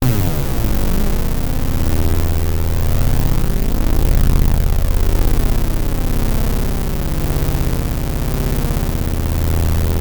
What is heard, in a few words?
C1, Korg MS-2000; Synthworx